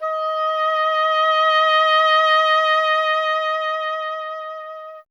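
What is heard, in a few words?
oboe, wind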